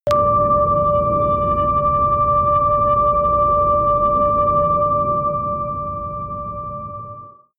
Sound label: dark noise beautiful bell long